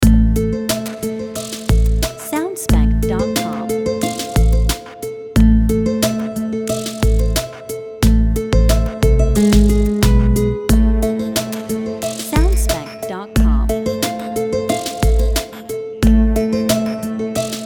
Mystical Triphop Main Loop

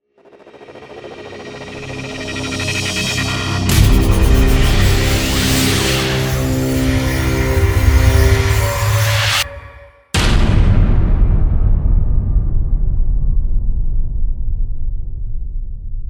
Hybrid Transformation Logo

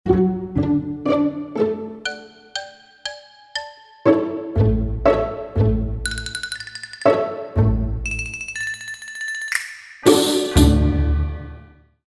Funny

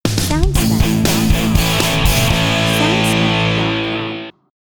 Rock The Logo

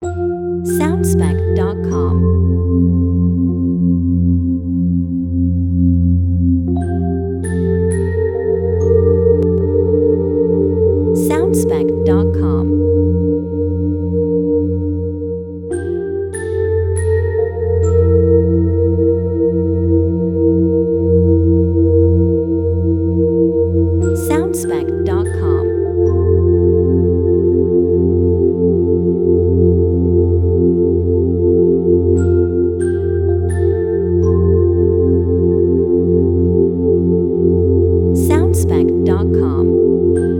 Synth Pad

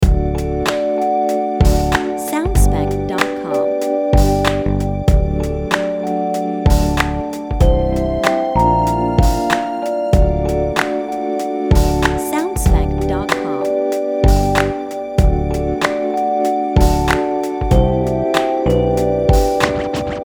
Neutral Pop Beat Menu Loop

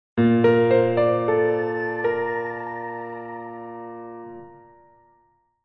Dreamy Piano Logo

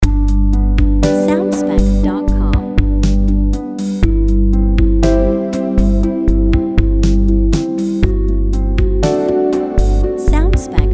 Afternoon Romantic Lounge Main Loop Track

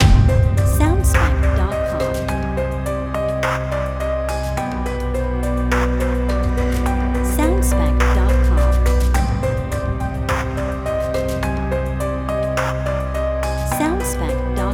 Epic Inspiration Bridge

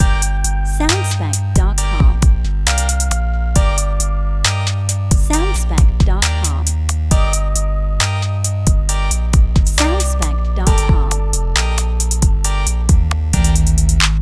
Gangsta Short Loop

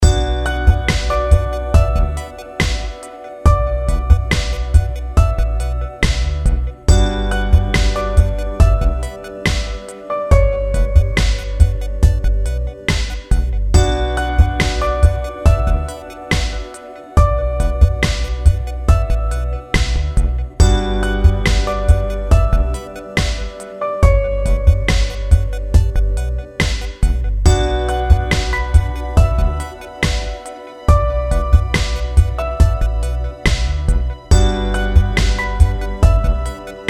Chill Out Short Loop

